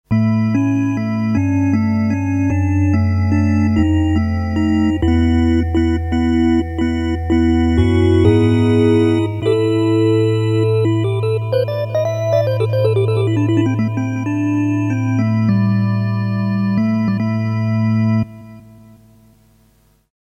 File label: RS370, Polyphonic harmonic generator, Blues Organ; Track 7 'Blues Organ' Use of the RS390